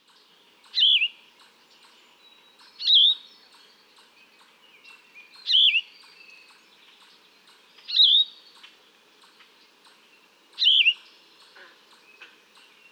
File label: Yellow-throated Vireo; one of the same song packages as heard three days earlier, on May 27. May 30, 2013. Atlanta, Michigan.